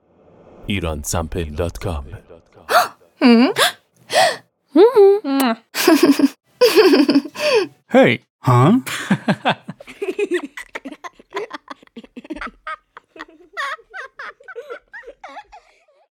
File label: human, human sound effect